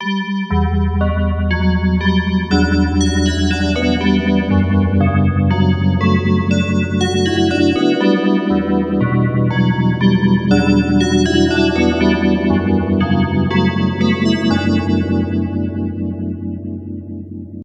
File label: DX7 bells, stereo polyphase